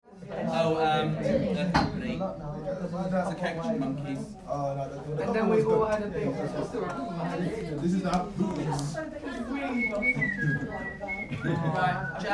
School Children Chatter at end of lesson; Secondary kids in class general chatter

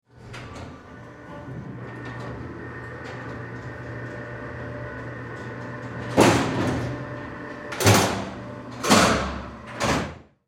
Emergency Jail Cell Doors Close; Prison Doors

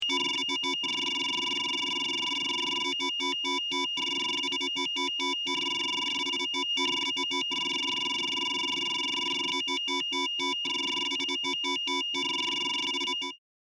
SciFi Scanner; Communication; Perfect for general Science Fiction usage or Multimedia usage; CraftedSignals

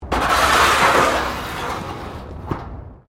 METAL BIN SCRAPE CONCRETE; Sound Effects:Materials

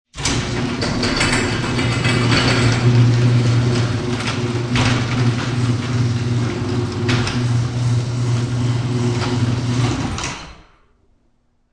Garage Door Opening

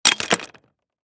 Hatchet Set Down Rocks Axe; Axes; Tools; Industry; Blastwave

